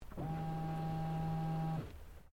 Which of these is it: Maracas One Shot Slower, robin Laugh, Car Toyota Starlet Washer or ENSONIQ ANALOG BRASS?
Car Toyota Starlet Washer